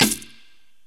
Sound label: STACKED PERC